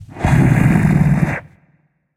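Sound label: thinking, nonverbal; smite; khepri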